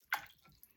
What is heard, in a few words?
water